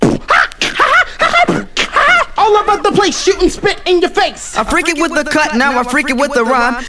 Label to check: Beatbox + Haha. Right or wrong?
Right